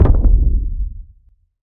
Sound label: magic